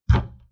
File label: wood door bang outside